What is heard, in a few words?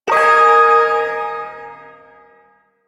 Ersatz Shawzin Yo Chord